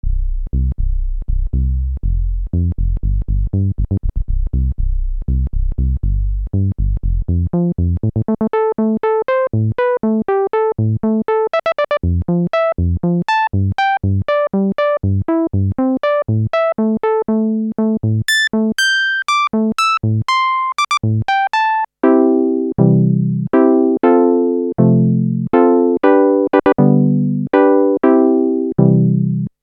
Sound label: clavia nord modular kb filter demo lpf24 lpf24